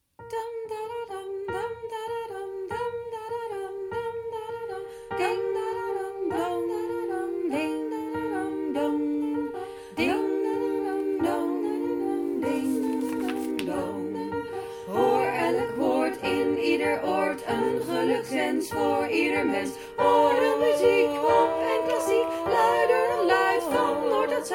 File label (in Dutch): Ukranian bell 3 stemmig; Setlist Kerst 2021